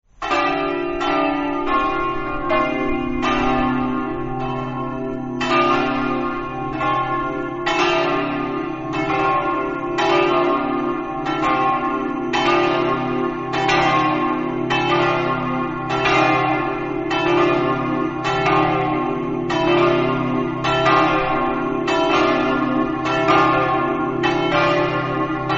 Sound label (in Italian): paderno, campane